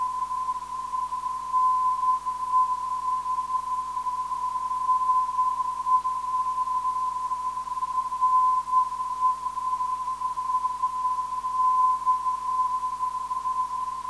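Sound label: ne 300khz, non-directional beacon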